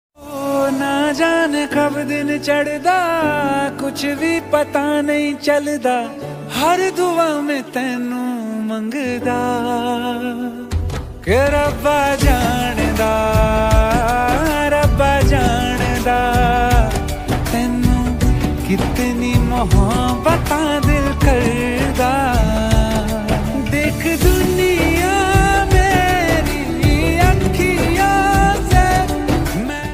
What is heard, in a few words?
panjabi ringtone